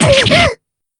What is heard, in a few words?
damage; nemu